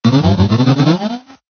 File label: talk